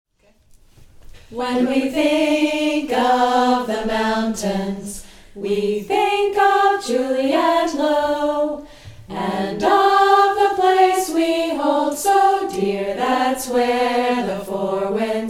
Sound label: slow song